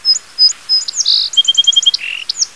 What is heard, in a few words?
sparrow